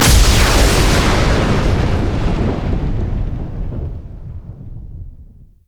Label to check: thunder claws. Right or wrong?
Right